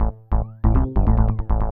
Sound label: SNTH BASS ELCTR 140 A, Techno